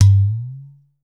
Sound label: udu; Voice Crystal - Steve Reid Definitive Percussion